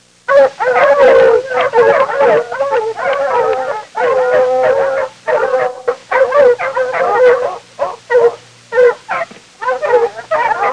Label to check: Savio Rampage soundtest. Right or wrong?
Wrong